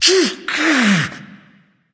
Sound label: ouch; smite; odin